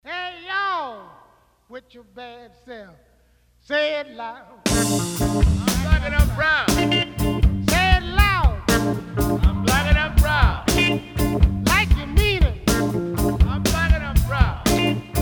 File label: funk smooth; surReal